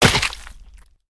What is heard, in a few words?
claw